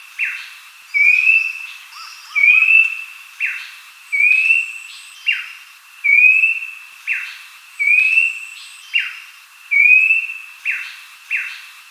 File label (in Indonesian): panggilan pelanduk semak